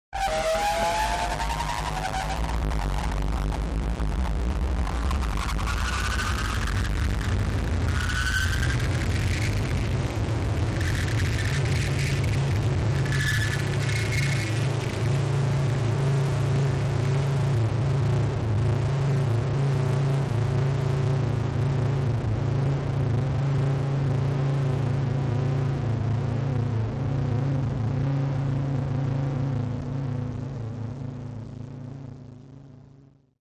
Distort Ascend Scrap, Distortion Distorted Ascending Scrape with